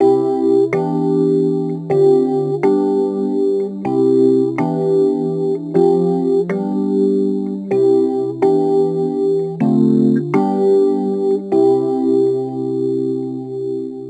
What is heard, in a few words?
Rhodes Attacks; Ueberschall